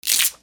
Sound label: RIPPING